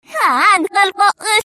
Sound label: clock, reverse